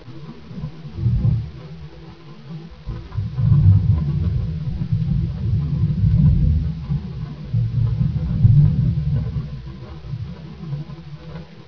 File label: rumble; ambience